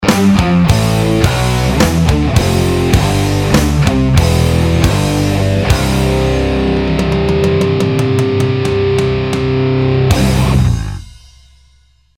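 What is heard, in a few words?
Nokia Rock Tune, ringtone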